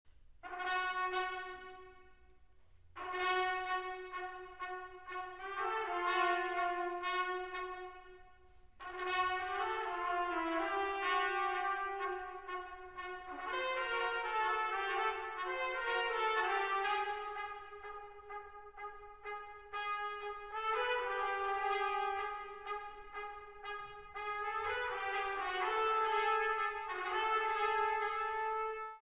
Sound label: fanfare ensemble